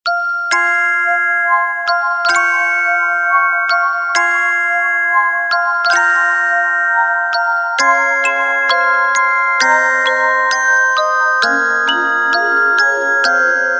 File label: Lullaby